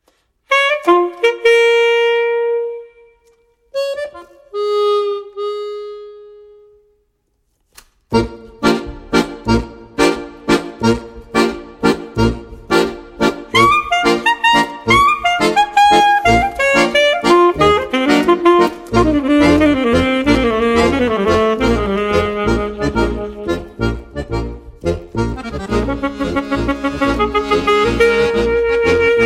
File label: sax akkord, accordion